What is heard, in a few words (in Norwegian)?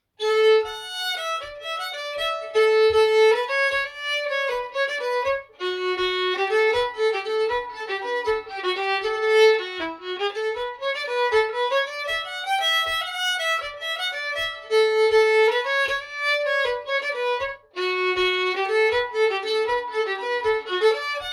Kefir og piano